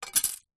set squares plastic set down on wood

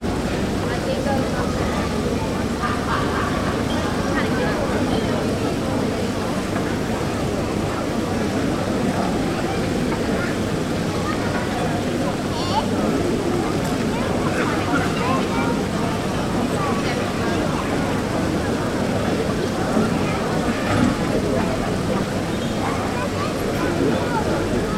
ambience urban town square carcassonne france; sound effects one

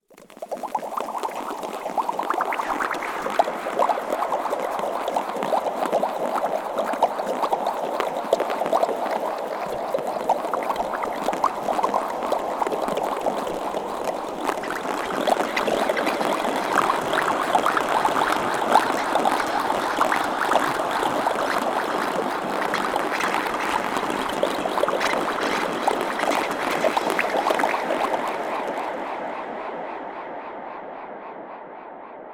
sound design bubbles mouth long delay reverb

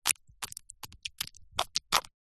slug eat designed, animals; zapsplat

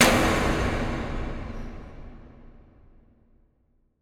impact metal hit large reverb industrial

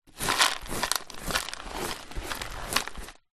food popcorn sweeping up carpet dustpan brush

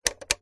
household alarm clock switch

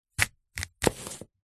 apple chop slice with large knife; food

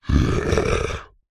horror monster short grunt growl breathy

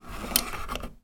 household heating thermostat dial turn

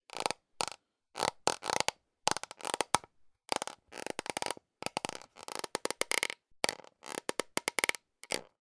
plastic creaks; zapsplat

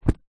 foley laptop case fabric empty set down carpet

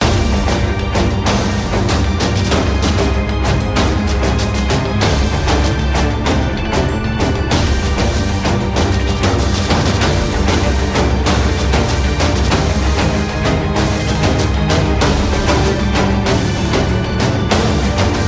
Epic; orlamusic

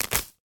foley grass rip from ground; sound effects one

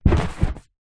household large inflatable chair sit down in

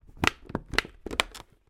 foley plastic easter egg case stress pressure

